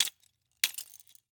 Rollerskates, old fashioned, all metal, wooden wheels, clash, strike, clatter, ping, double, hesitate; Eiravaein Works; Vaeyan IV